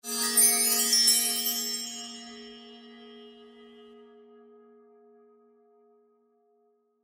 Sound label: Tribal Accent Mysterious; Musical Sound Design Sound Effects